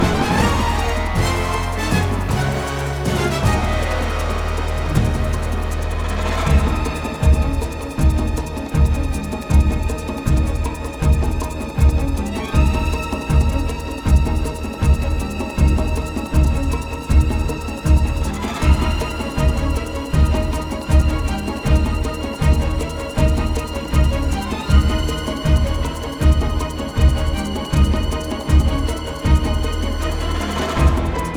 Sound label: Music Ingame Boss Ankylosaurus Loop; Boss 04ab